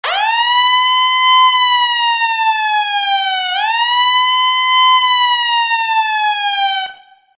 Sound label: ambulance siren